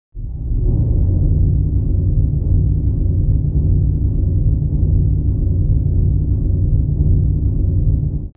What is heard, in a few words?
Cartoon earthquake